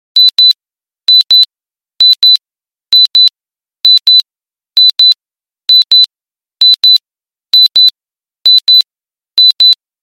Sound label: Watch Alarm